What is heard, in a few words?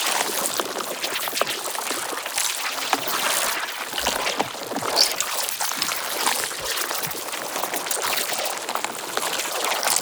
Entangle Projectile Loop; Warframe; Oraxia